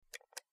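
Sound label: Low pitched Mouse Single, mouse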